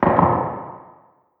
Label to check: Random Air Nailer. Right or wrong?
Right